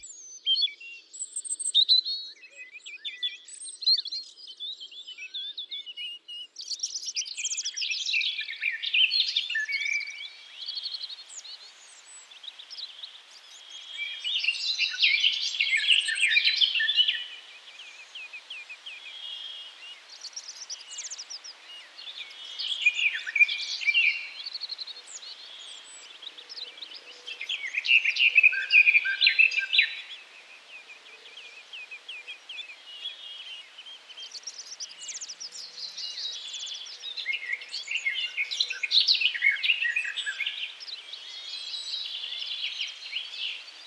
zvuki prirodi slavka

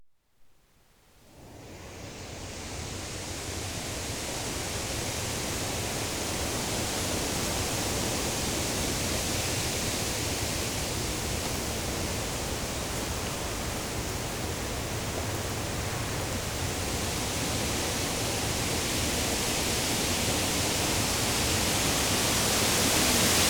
vetrennii den v smeshannom lesu, zvuki prirodi

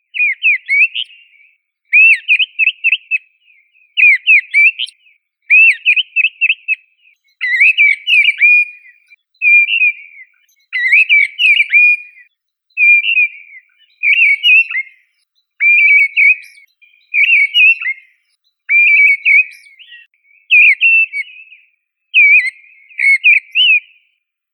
drozd deryaba turdus viscivorus

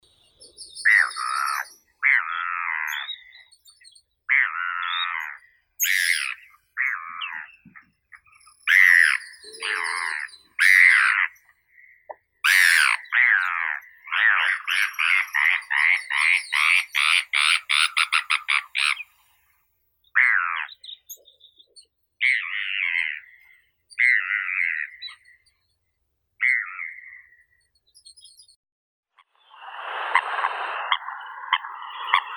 chaika bonopartova larus philadelphia

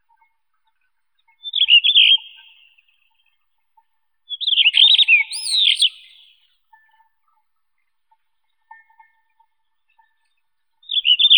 drozd sinii kamennii monticola solitarius

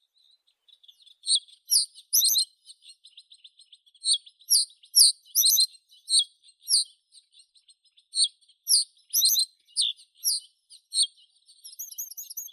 ovsyanka kamishovaya emberiza schoeniclus

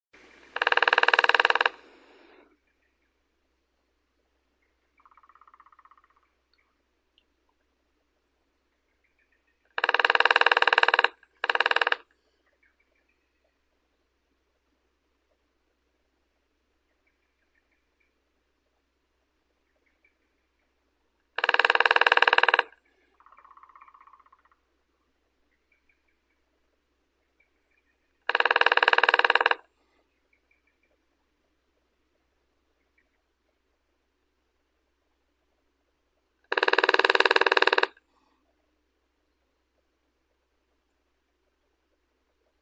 dyatel malii pestrii dendrocopos minor

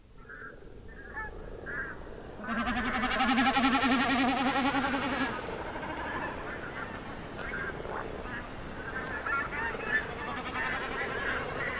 noch na zalivnom lugu

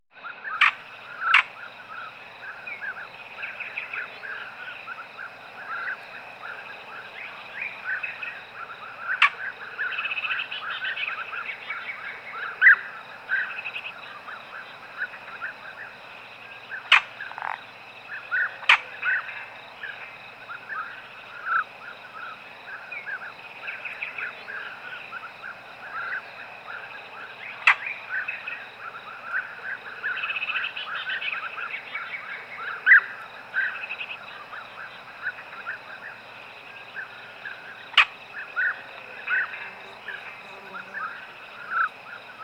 na zabolochennom beregu

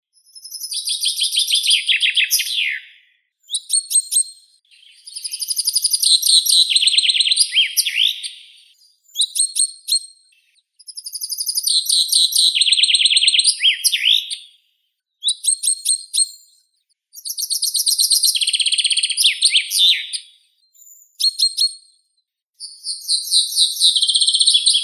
zyablik fringilla coelebs, zvuki prirodi

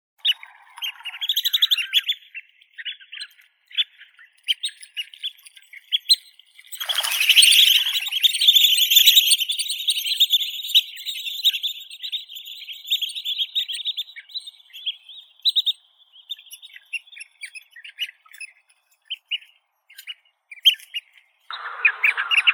veretennik bekasovidnii amerikanskii limnodromus scolopaceus

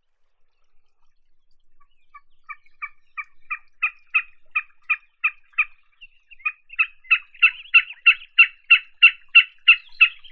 bekas amerikanskii gallinago delicata

